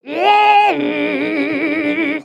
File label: Ribbit Frog Sing; creatures organic